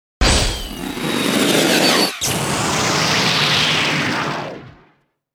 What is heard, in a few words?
Tornado